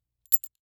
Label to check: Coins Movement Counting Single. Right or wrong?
Right